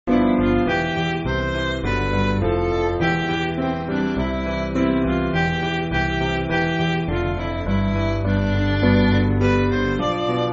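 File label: Midi, Backing Files for Churches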